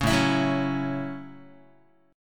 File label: A 6th A Guitar, chord; strummed